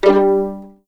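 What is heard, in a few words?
G3 pizzicato F, violins; East West; The Ultimate String Collection; VLNS PIZZ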